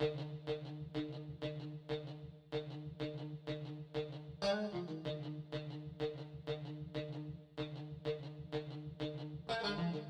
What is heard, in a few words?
guitar, Eb